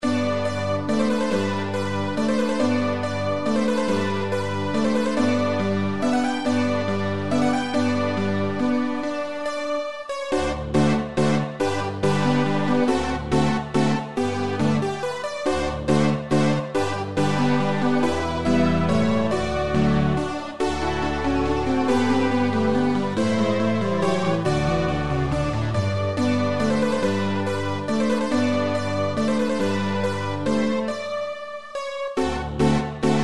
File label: minuet g, classical midi